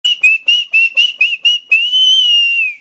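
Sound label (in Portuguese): assovio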